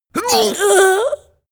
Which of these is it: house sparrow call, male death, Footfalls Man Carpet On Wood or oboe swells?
male death